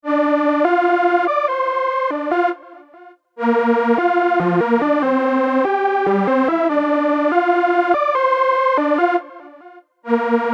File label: bass synth strings